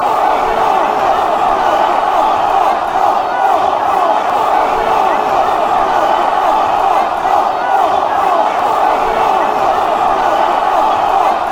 off off off, fanchants

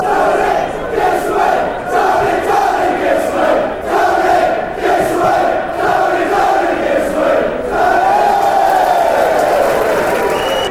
downing give us wave, chant

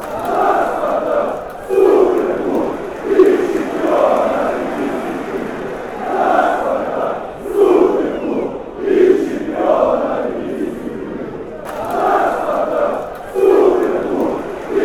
ooeeeooo spartak, chant

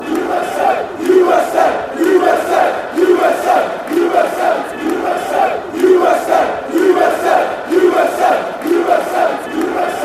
usa reading, fanchants

